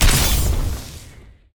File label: enemy attack penetrate hit; bloom minigame